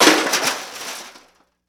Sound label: mechanical Break